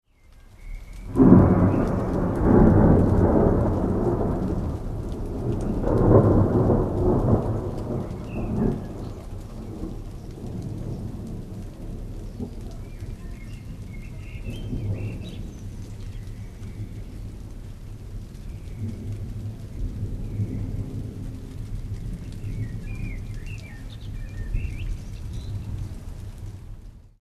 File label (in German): regenwald gewitter; salamisound